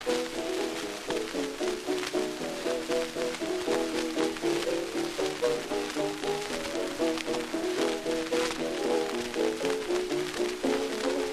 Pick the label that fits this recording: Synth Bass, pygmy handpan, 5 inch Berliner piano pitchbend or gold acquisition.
5 inch Berliner piano pitchbend